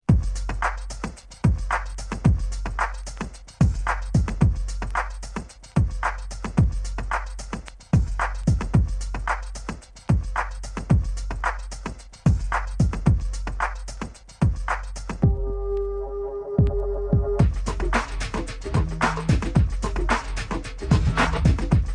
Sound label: beat